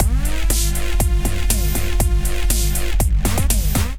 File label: Kit; Underground Rush Kit; Ableton; Build and Drop